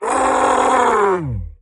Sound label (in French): attaque, monstres; deadmaze